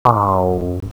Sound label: hurt; DesertTrek